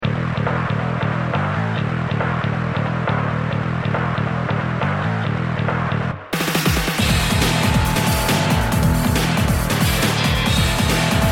bgm normal boss; goken

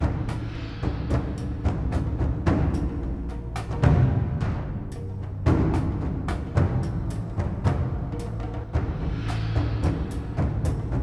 Creep Medium

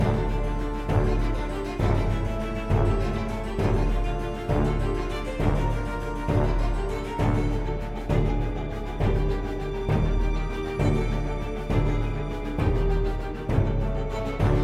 battle intense; Shadowhand; macos windows gamerip 2017

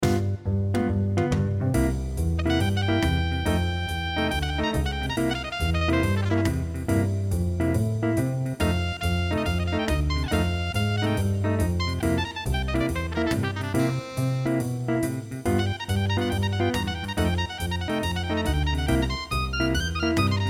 Jazz

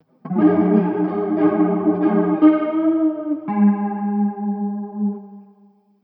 Melody; Hylics

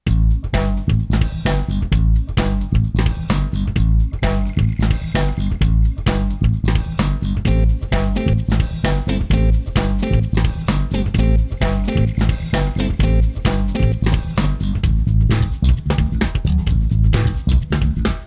Funk, gamerip soundtrack; Backyard Hockey

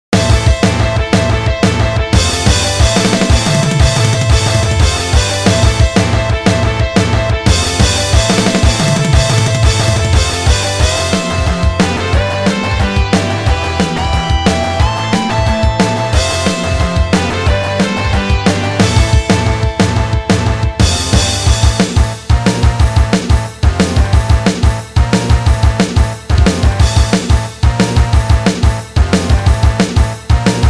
sound music raid; Dangeon Quest; android game music

